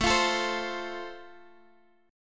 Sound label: A Minor Sharp 5th, chord strum; x,x,7,10,10,8; strummed; Am#5